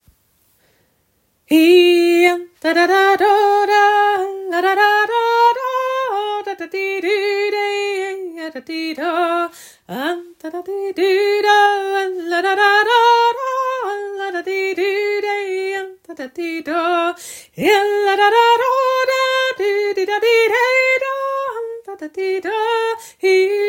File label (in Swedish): polska schottis; efter johan dahl